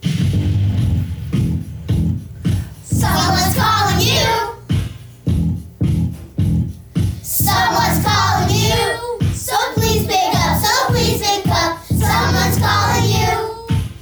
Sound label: ringtone